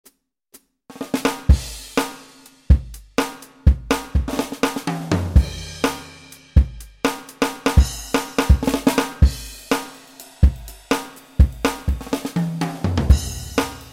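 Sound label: 70s Jazz Funk KIT; xv-5080; SRX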